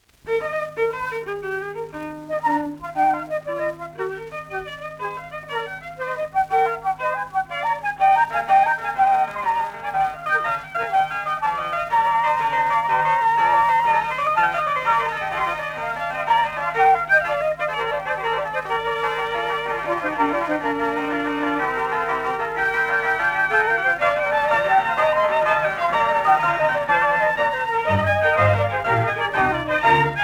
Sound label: 5th Brandenburg Concerto (D major) III. Allegro; J.S Bach; L'oiseau - lyre